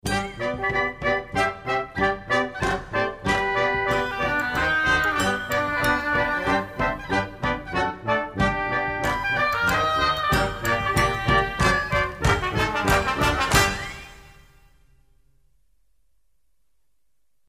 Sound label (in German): lustig motiv fanfaren